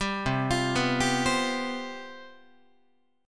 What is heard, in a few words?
C Major 7th Suspended 4th, C, down, Guitar; arpeggiated; CM7sus4; 10,8,8,9,11,11; 4A,4E,5A,5D,5G#,6C#